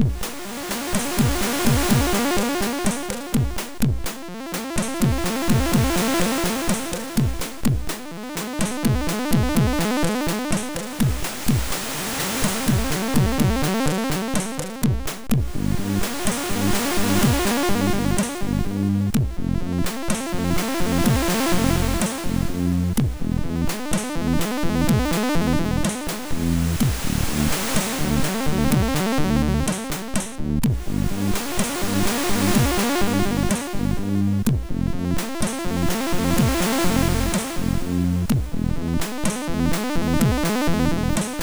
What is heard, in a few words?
sid MOS6581